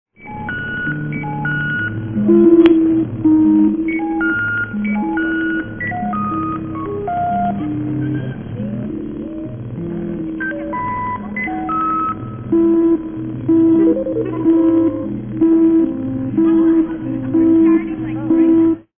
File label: orch